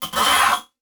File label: Multimedia Internet CD-Rom Flash Wipe